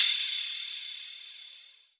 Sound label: Open Hihat; Vengeance Samples; VEE Cymbals